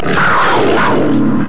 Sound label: wildcat growl